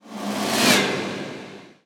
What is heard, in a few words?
Whoosh; Ghosthack - Quantum Force 4; Absorb